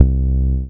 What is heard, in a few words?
Single Acoustic Basses; Best Service; Dance Floor Bass